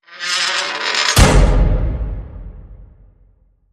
Behind You Metal Door Slam

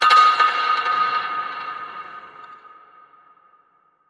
Sting Hallway Echo

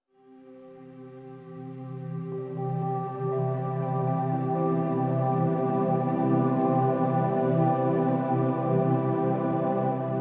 Link Sting

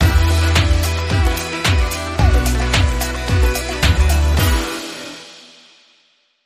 Disco

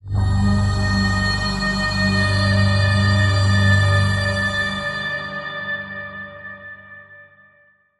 Link Sting

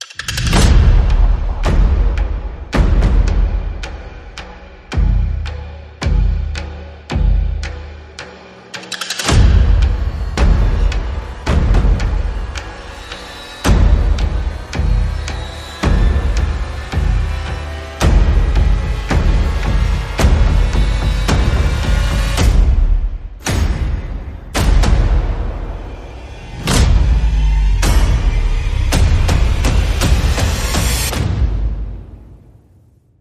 Percussion and Effects

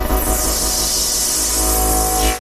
Dangerous Power Buzz Transition

